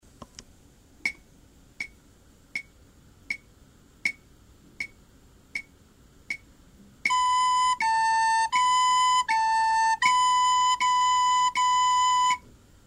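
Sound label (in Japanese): たこたこあがれ, リコーダー; 3年生; 成隣小学校 WEB音楽室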